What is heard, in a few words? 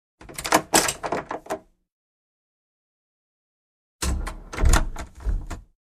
They opened and closed the door with the key; Открыли и закрыли дверь ключом